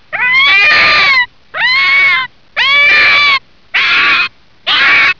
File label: red fox distress